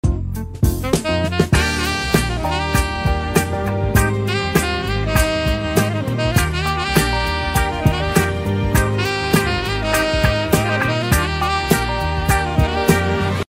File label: saxophone example, saxophonist